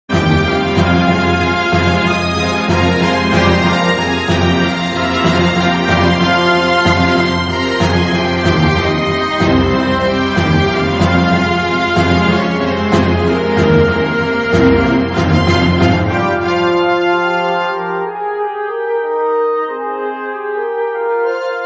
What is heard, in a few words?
Orchestral